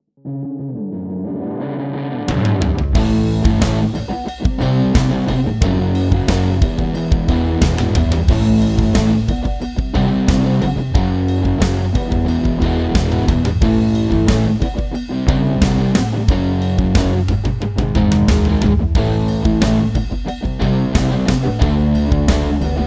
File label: Garage rock song